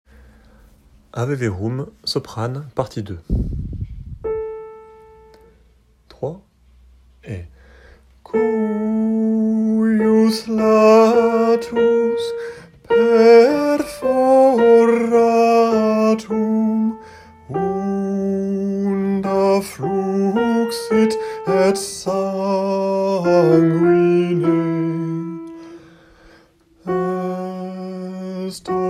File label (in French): Ave verum soprane; Mozart; Ave verum P2